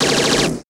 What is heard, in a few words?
machine gun; Total Control - Action Cutz